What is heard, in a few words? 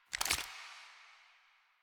Pistol Reload Start; Weapons; DE; Warframe; Tenno; Lasrian